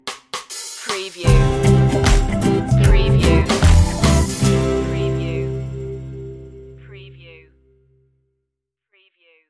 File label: reggae roots stinger; island